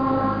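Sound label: STUKA DIVE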